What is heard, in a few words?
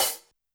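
Hi-Hat Closed; YAMAHA RM1X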